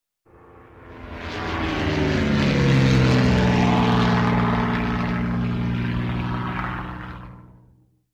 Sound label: Light Aircraft Whirring Sound